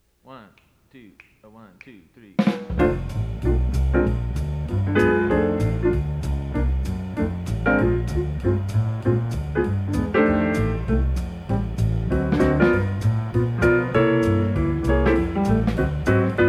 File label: horns guitar f blues; Jazz Studies Auditions